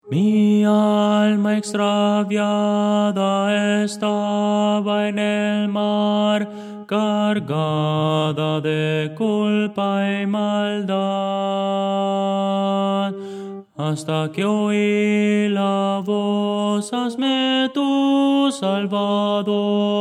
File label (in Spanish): mi alma extraviada estaba en el mar tenor, himno